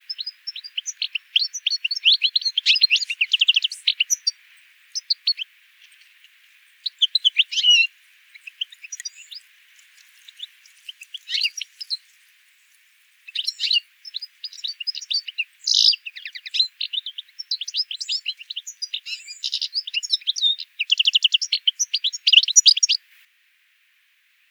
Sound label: carduelis carduelis